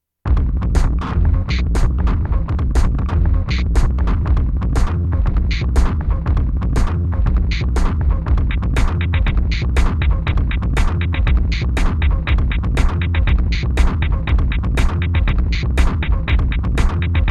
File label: lofi hiphop